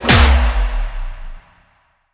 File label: Land; smite; raijin